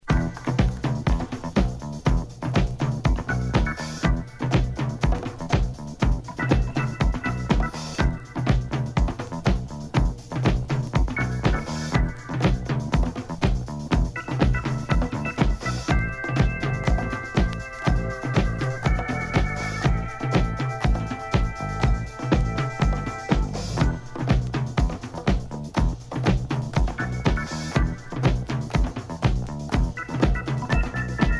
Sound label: Drum Band Jerky Rhythm